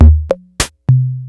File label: 4 In The Floor Percussion Combo